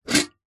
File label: household teapot metal old pick up from hard surface